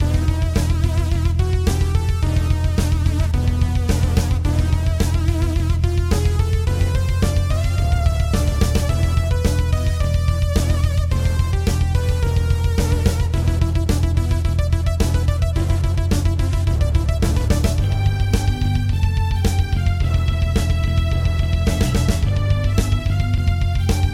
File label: Magma Rock; Golden Sun; Dusk of the Lost Age